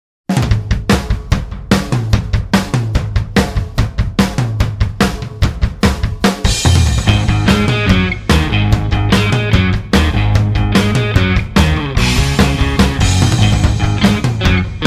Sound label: My New Wave; Soundzabound Volume 5 Disc 3